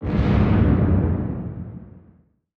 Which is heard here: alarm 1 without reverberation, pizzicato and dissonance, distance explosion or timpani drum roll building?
distance explosion